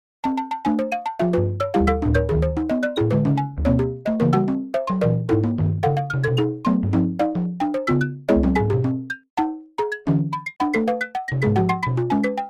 indian percussion dukki tarang

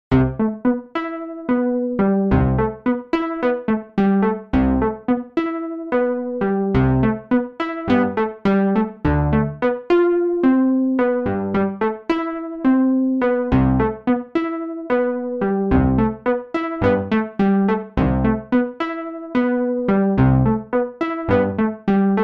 quick lift

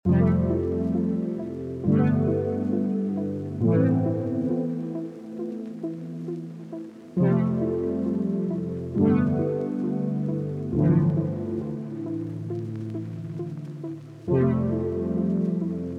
full melody am 135bpm

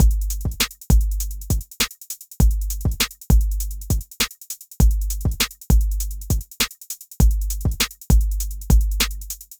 hiphop drum

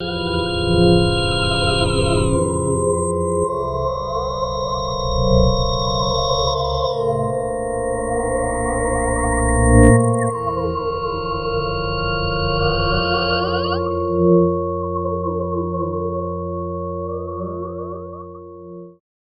atmo inertia field

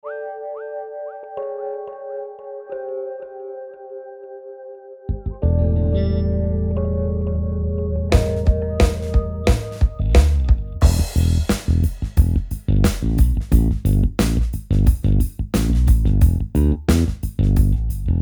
virtual bass; ujam instruments

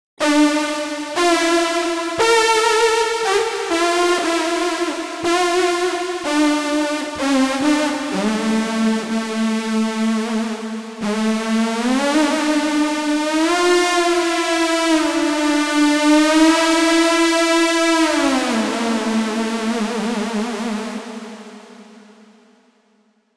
vocalsynth

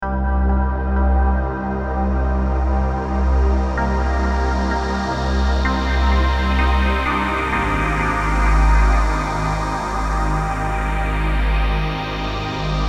pads; ana

